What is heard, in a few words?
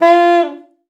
sax f4, Saxophone slides; Session Instruments; Best Service ProSamples vol.42